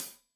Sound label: Hat Tight, Hi-Hat, Cymbals; Deeply Sampled Drums